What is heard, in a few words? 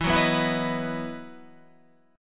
E Major 7th Suspended 2nd, Guitar, Half Step Down; EM7sus2